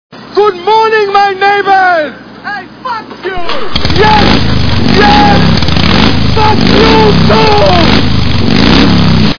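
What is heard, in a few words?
morning neighbours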